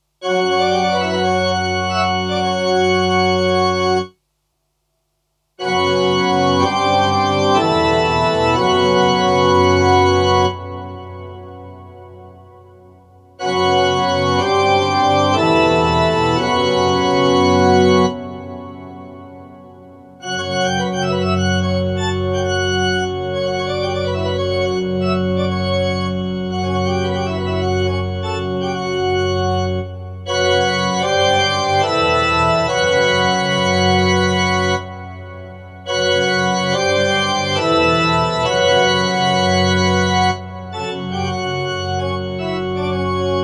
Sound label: Lexicon PCM70 Long Hall Organ